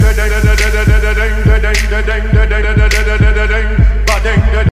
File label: Hip Hop Beat Loop